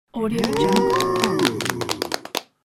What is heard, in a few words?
cartoon cows win applause